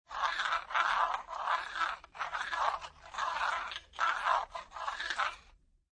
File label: CPU Voice